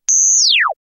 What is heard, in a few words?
Synth Beep Sweep; synth tones